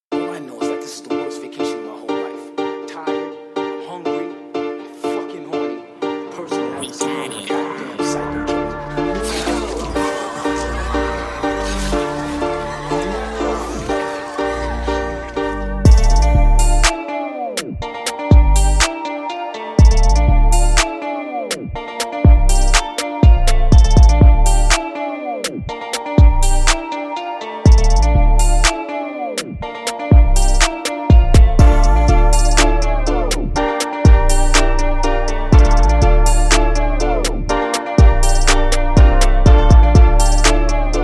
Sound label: Guiter Piano Trap; Scottbeatz